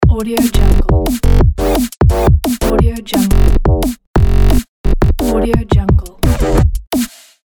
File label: drum n bass electro logo